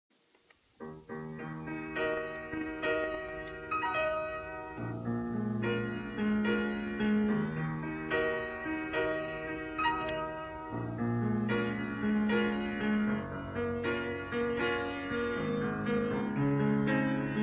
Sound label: Piano Solo